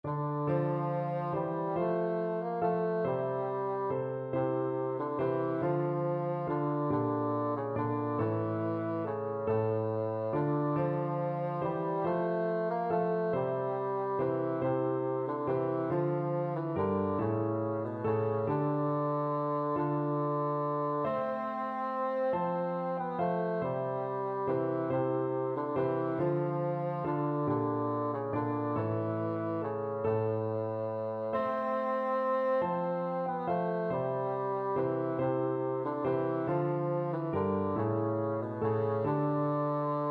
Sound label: what child, bassoon